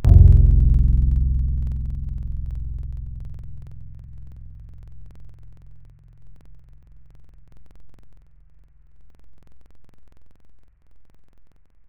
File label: Banjo, wavetables; Synthetic's Serum Collection; Standalone-Music SYMPHONY Wavetables